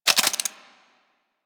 Pistol Reload; Weapons; Warframe; Tenno; Guandao

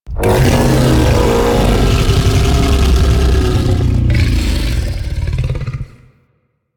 Monster Injured; Warframe; Infested

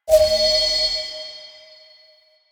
Courier Pickup; Warframe; Duviri; Courier Delivery

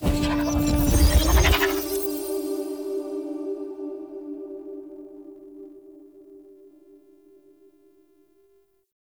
Armour Plating Cast; Powers; Bromius

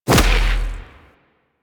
Edge Kick; Warframe; Warframes; Pacifist

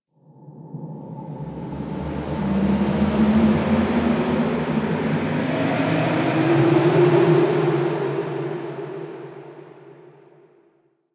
Exterior Gust, Ambience; Empyrean; Warframe; Railjack

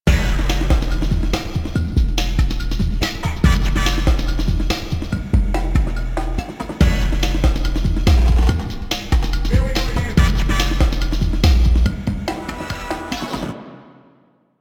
Music Continue; Warframe; minigames; Caliber Chicks minigame

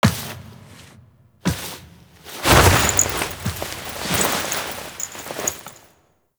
Angel Reveal Foley; Warframe; Zariman Quest

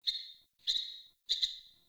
Sparrow Death State Song; Animals

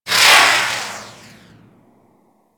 Beam Gun Loop One Off 3D B, weapons; Player Path Beam; Fomorian

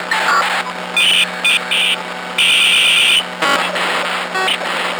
Hack Minigame Urgent Loop; Entrati

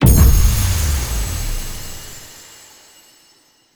Dialog Options Close First; Conversation Cinematic; Warframe; Lotus Eaters; Quests

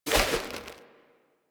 Fishing Spear Charge; Warframe; Weapons; Eidolon Fishing Spears

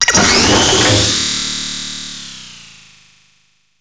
Osprey Deploy; Warframe; Corpus

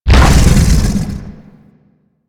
Biped Vocal Death; Warframe; Man In The Wall

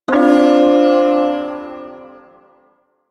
Ersatz Shawzin Maj Chord; Duviri

